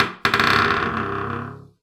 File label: Door Creak